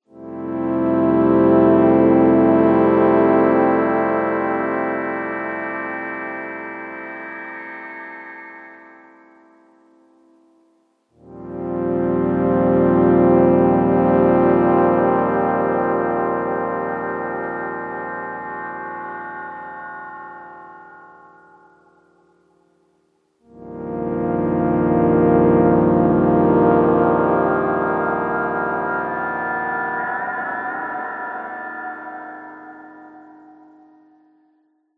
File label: alarm